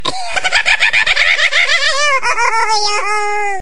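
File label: laughter SMS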